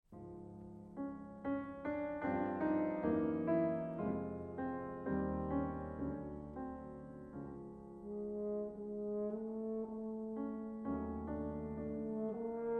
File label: Suite For Horn And Piano, Epilogue